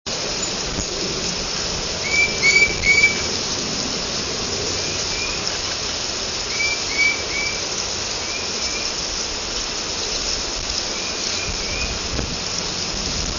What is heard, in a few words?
titmice duet, tufted titmouse; birdsongs